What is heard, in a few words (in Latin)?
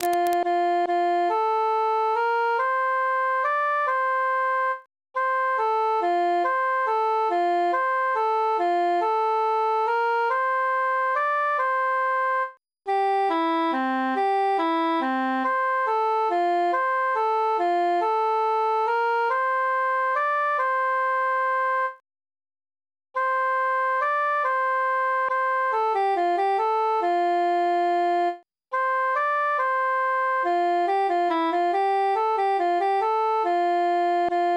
altus solo; in dulci jubilo